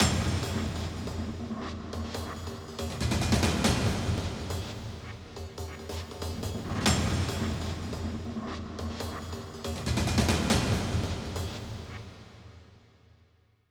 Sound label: SONG DAMAGE LOOP; KONTAKT LIBRARY